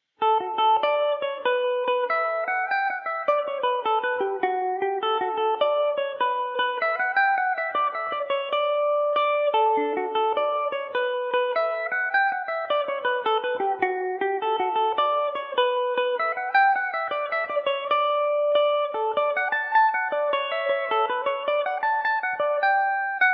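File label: belfast ham D major revelation revisited, mandolin; Revelation Jaguar electric mandolin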